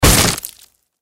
Human Splat Crash Wood Impact Crack Hit Heavy; Splats; Blastwave

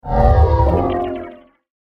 Electronic Laser Shield Whoosh; Andrew Fleming

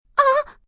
Sex Short Ah, Sex, female, short; VoiceBox

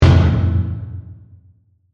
Hit Metallic Punch, Dark; Production Element; BlastWave

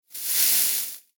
Foley Grass Movement Foliage Single; Environmental Sound Effects; Environmental (Sound Effects:Nature)

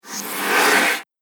Dark Pressure Horror Sweep; Scary Soundscape Sound Effects

